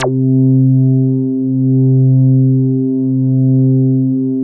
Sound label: MINIMOOG; Big Fish Audio - First Call Vintage Keyboards